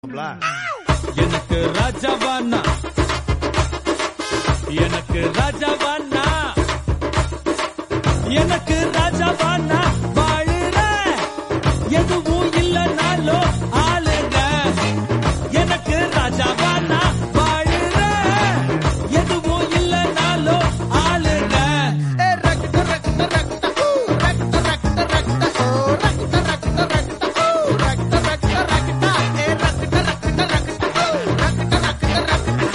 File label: tamil